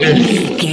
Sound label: troll monster hurt pain long